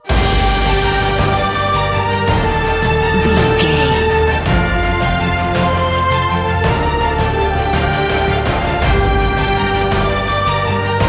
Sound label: hero cinematic trailer